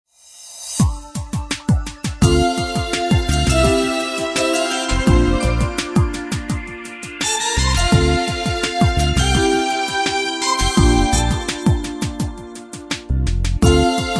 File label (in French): groovy